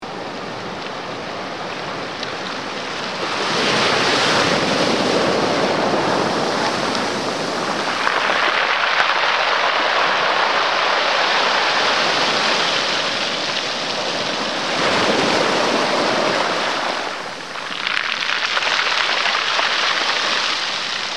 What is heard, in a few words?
cobblestone